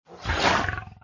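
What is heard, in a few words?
hit lang, wolf; shouLieGaoShou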